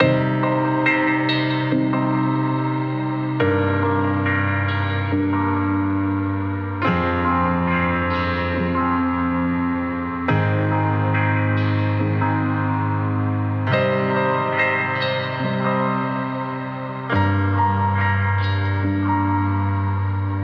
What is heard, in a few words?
Piano - Cm 70BPM; STEMS; Ghosthack; SkyRealm; Mood Kits; Mood Kit 09 - Washed Ashore